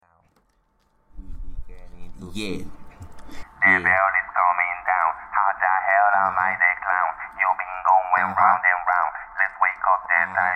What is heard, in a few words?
rap 120 Bbm; acapella